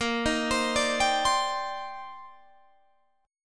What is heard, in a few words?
C Minor 9th Bb Guitar Standard; Cm9/Bb; arpeggiated